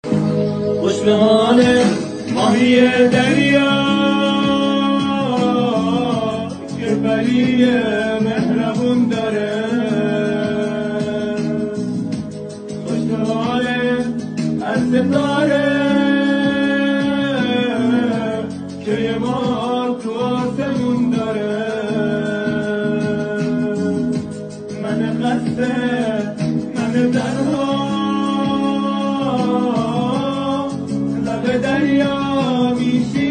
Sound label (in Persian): gitar